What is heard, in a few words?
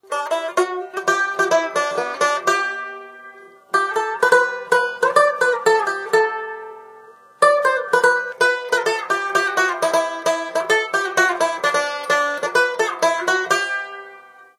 Banjo; out from boneville